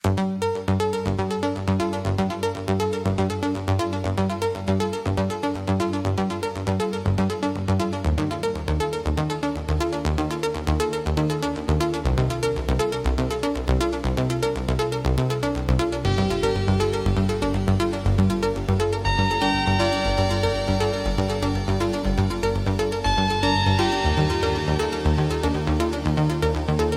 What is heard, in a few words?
Electronic Impulse